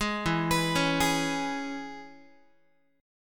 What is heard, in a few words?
E 6th, down, Guitar; arpeggiated; JGuitar